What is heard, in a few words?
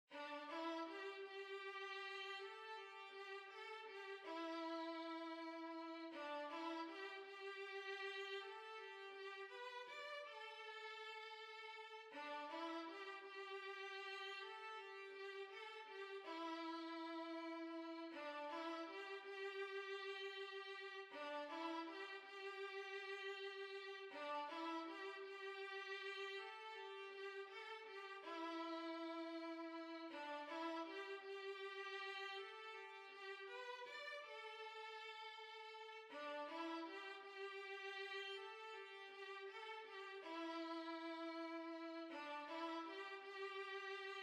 Violin